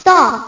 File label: storm; ActionCat